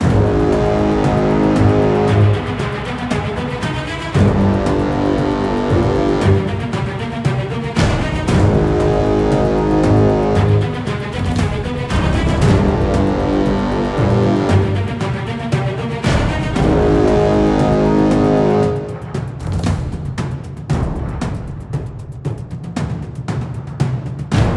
combat boss; Biomutant

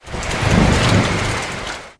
Rain Lose; gamerip

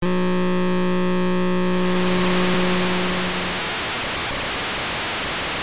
Cheer SFX; Pop'n Music GB Disney Tunes

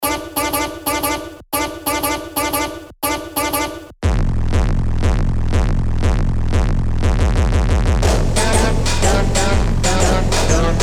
unlock march; We Bros. X

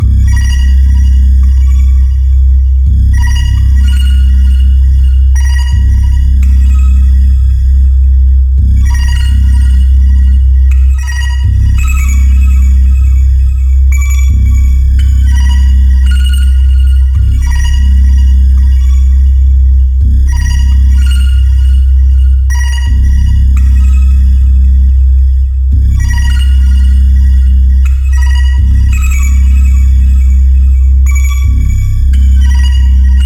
BGM server Room; PS Vita

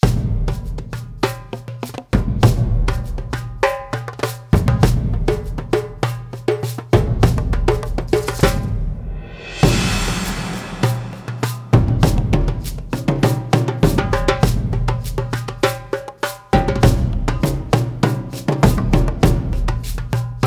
Perc Egyptians; Die Siedler Aufbruch der Kulturen

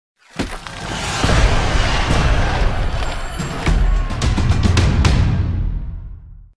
Logo Reveal; Movie New Hd

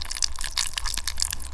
Flesh Move fast

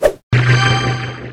Character Defence

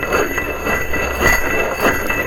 Scrape glass fast; Puddle; puddle plus ios gamerip 2018

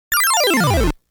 Coin; R-Type Original Sound Box

